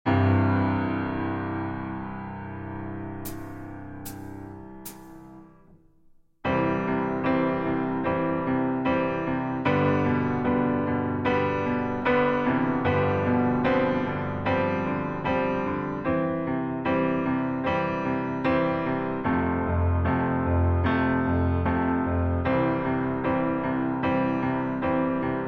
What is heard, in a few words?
C No Backing Vocals, backing tracks